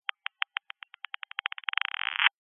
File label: science fiction radio receiver transmission interference burst clicks speed up; hang up; zapsplat